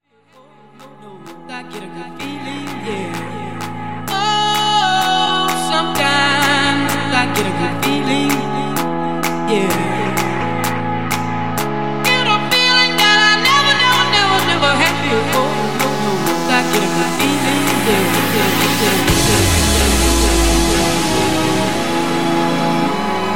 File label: Club Intro 128Bpm